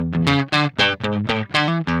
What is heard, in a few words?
GUI, licks; 120 LICKS